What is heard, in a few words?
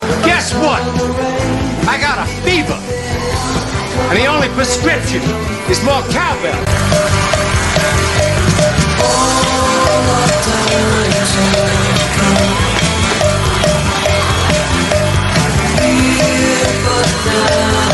more cowbell